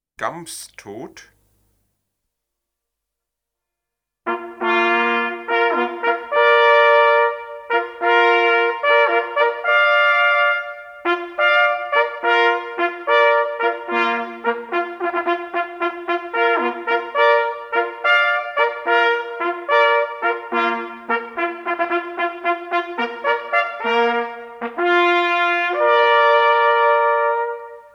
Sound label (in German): Gams Tot, jachthoorn